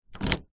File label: car key into door lock external; transport